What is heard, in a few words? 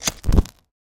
Fire Lighter Igniting Gas Stove; Gamemaster Audio